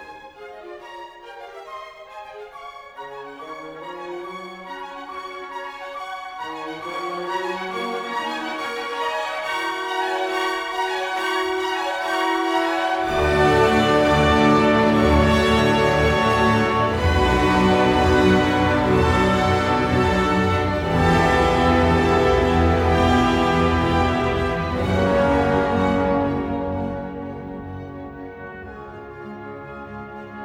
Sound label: 4th Movement Chorale Recapitulation; Bruckner 9th; Rattle